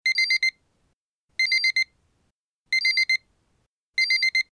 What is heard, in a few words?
watch alarm; time-lab